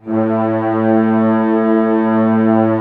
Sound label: ORC A1 FF, Orchestra; Sound On Sound - Peter Siedleczek's Orchestra